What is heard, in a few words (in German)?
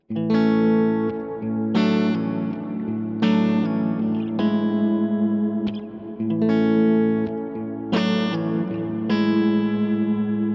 Ambient Deep Mittelstellung; Walrus Audio Fundamental